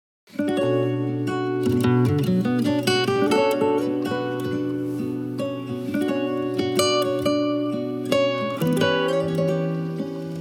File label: Guitar Version